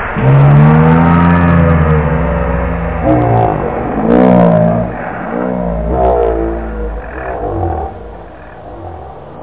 vocal

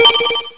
Login sound

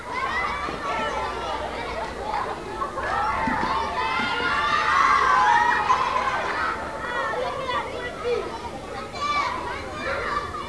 MUSIC kids bkg loop

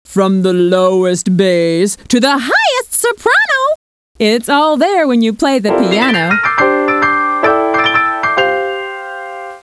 pian; Lost & Found - Volume 1; OmniMedia; puzzles

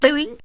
Vocal Doing, Bings & Boings; Beep Sounds

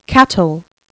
cattle

